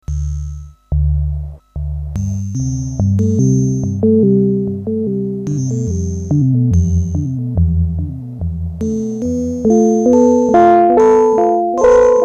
Filter Delay; Anamono

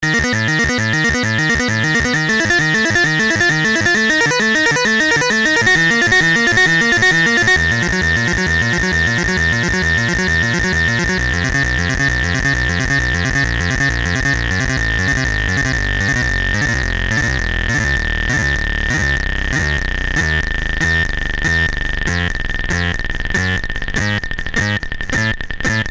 anamono Morphing Seq

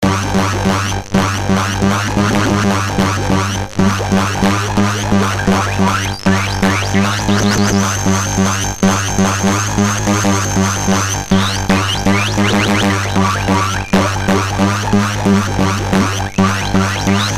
Glitch Bass; Anamono

